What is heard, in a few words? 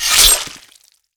kill stab out